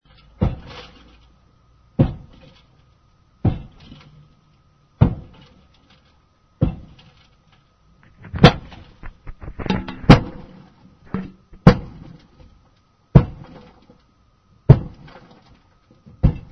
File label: construction